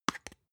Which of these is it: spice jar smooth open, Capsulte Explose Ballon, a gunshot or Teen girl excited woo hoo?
spice jar smooth open